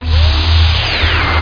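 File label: droid down; Shadows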